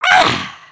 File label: drink